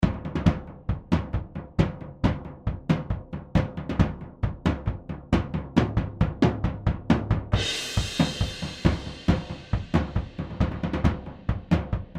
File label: Drums; GA-47